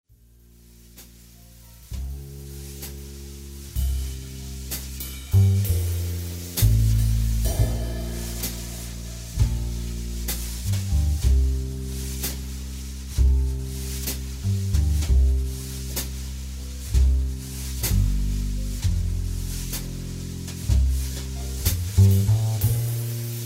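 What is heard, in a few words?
bs dr, bass drums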